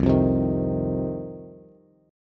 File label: C Minor Double Flat 5th C down Bass; Cmbb5 strummed; JGuitar